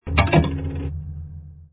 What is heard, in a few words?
turn on